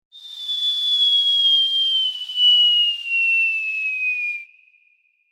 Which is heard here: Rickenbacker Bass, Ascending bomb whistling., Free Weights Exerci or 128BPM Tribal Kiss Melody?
Ascending bomb whistling.